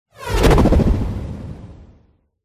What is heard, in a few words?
feya pet buff; feya4